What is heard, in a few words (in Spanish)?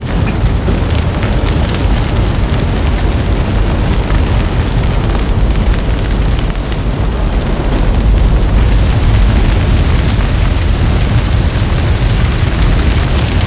fuego; o que arde